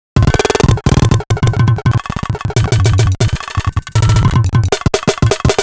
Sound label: Loop 170 bpm, Stutter Sounds; 99Sounds; Mangling Audio